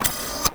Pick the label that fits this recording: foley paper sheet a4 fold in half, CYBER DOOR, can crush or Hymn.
CYBER DOOR